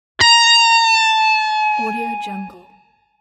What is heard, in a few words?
cartoon guitar